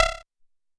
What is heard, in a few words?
Sci Fi